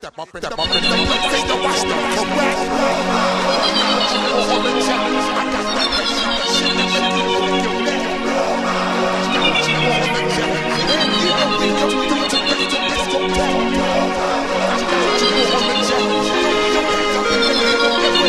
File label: drumms oldskool breakz